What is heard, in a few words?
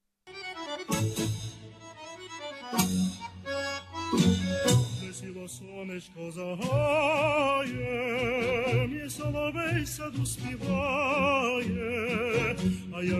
Old Ukrainian tango